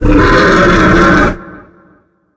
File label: Crystal Dragon Death; Kilta